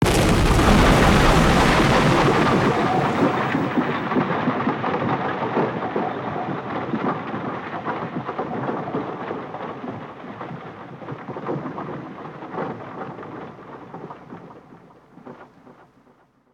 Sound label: wall breach